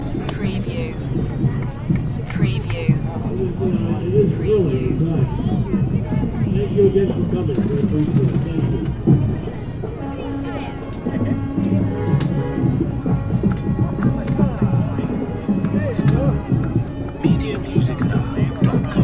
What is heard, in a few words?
Parade